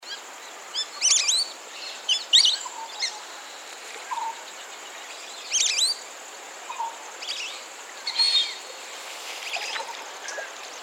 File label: Lesser Whistling duck Fulvous Whistling duck; Anavilundawa; Sri Lanka